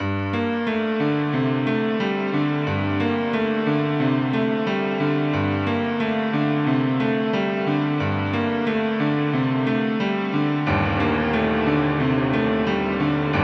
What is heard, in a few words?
Piano; American Horror Story